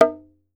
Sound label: earth drum hi; Voice Crystal - Steve Reid Definitive Percussion